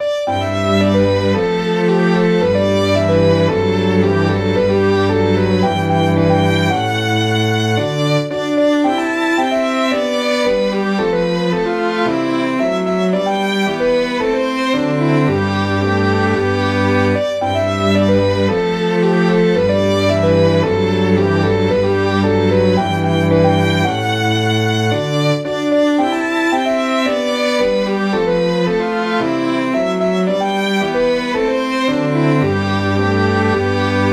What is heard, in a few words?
Scotch, synth